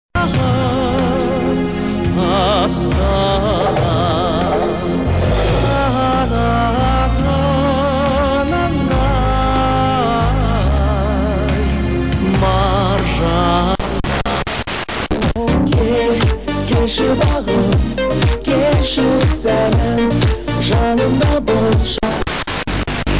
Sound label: 85 110 MHz